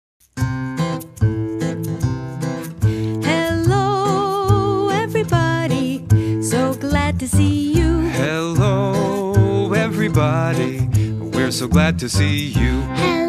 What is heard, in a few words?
hello everybody singalong